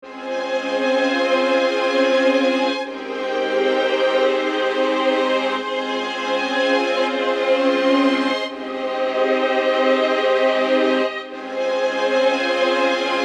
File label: Sad Strings Wide Reverse; gforce software